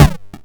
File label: Crushed Perc, bitcrushed; musicradar